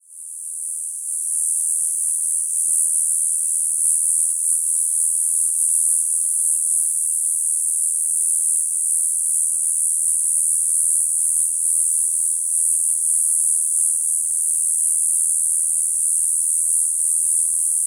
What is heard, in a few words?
Okanagana rimosa clean, cicadas